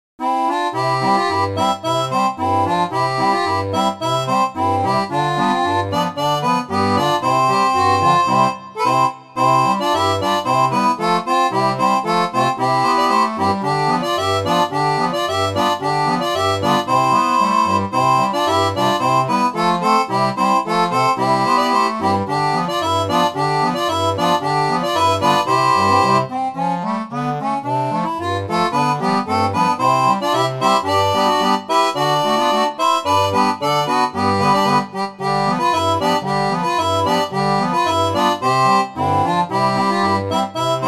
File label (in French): seraphone, orgue barbarie